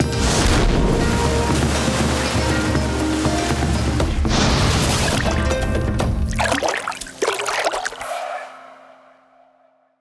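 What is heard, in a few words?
big win water music sfx; DoubleTigersWD; wazdan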